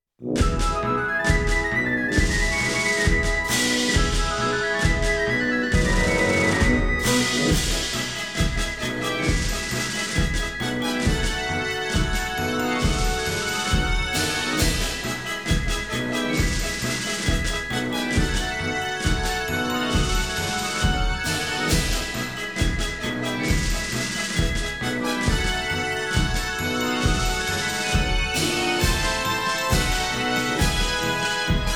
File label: Original Karaoke; Utau de Pon; Advance Competition Puzzle Balls